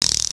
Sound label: Cube Spinning; VLNavigate™ Demo 1.02